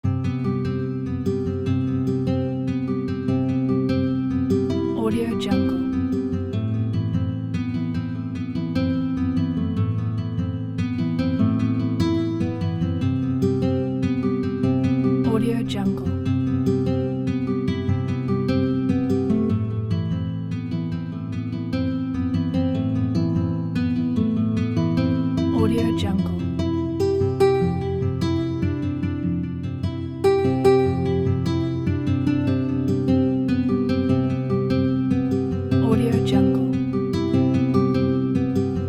guitar

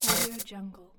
scifi inventory item

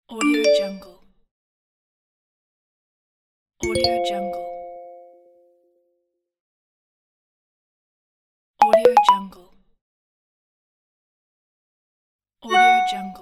phone notification signal

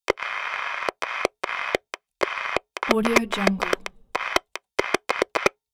macdonald 2way 3channel cb; soundmorph

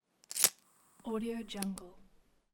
cigarette lighter

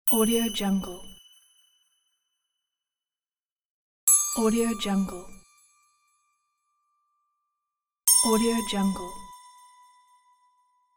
magic ding; teldrassil

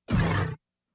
synth roar growly ascend short bursts

